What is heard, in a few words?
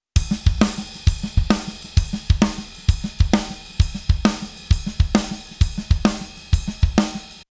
dbx160A Drums Wet 10db